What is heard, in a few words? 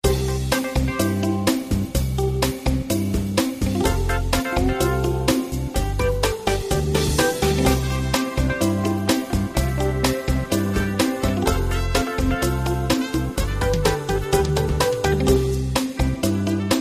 Cha Cha